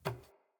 Ladder Climb Wood

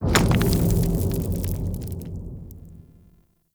Town Stone Debris; Ambience; Warframe; Duviri

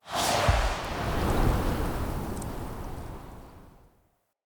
Wolf Summon Ghost Appear; Animals

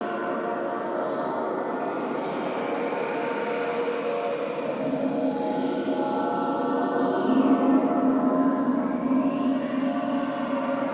Root Cave Bed Loop, Ambience; Neathuns Root Cave

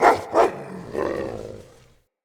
Pack Wolf Alerted Bark; Animals; Perception

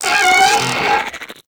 Arachnoid Wraith Vocal Attack; Warframe; Corpus

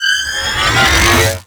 Space Fighter Elite Laser Warp Start; Warframe; RailJack; Corpus; Space Fighter Laser Agent

